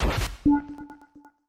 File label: Final Gate Switch; A Robot Named Fight